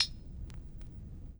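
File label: Vinyl Closed Hat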